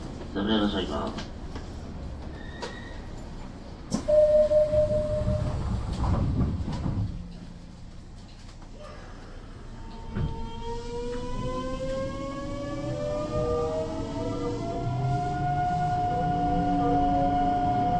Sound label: vvvf, mitsubishi; nishitetsu 6355